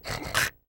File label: snoring